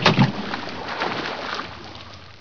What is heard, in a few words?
splash metal; Source Engine; bshift